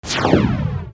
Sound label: fire three, modern weapons; golgotha